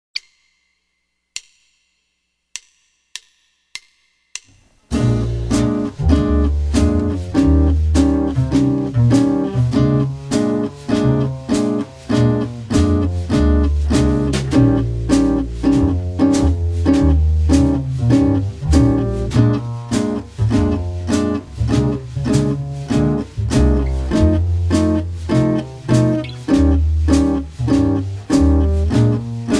Bb Major Root 6

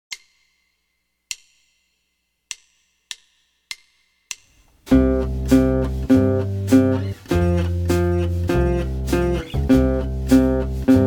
12 Bar Blues String 5 Roots Only

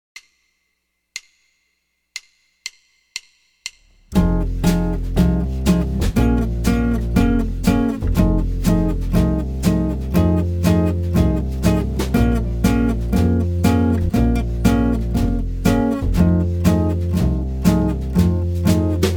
Minor Blues F Minor Root 6, jazz guitar

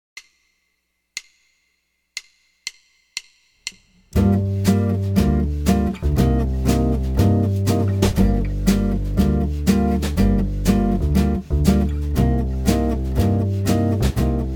Minor Blues G Minor Root 5

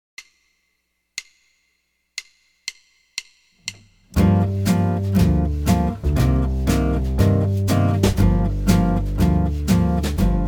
Minor Blues G Minor Root 6

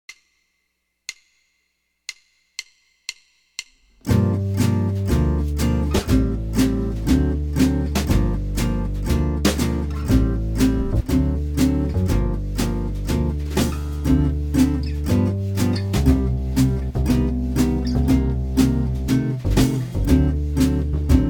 Minor Madness G Minor

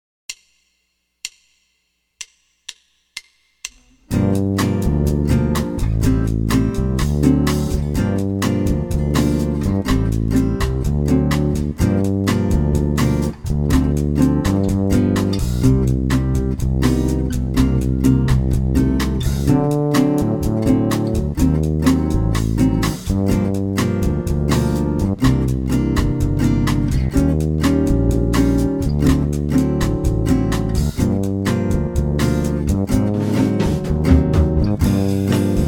Menor Locura G Minor

